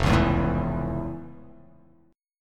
F Augmented Major 7th, chord; F+M7; strummed

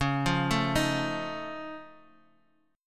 F 7th C sharp; F7/C# arpeggiated

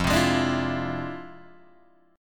F Minor Major 13th, Guitar, DADGAD

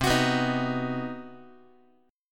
C Minor Major 7th Flat 5th, Guitar; strummed; 4A,4E,5A,5D,5G#,6C#; x,8,9,9,7,x; JGuitar

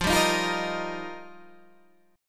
F 7th Suspended 2nd Suspended 4th; F7sus2sus4; strummed; 13,10,13,10,11,11

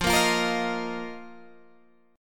D sharp Suspended 2nd, F

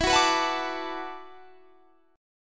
E 7th Suspended 2nd Suspended 4th, down

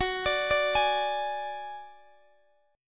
F sharp Suspended 2nd Sharp 5th, Guitar, DADGAD

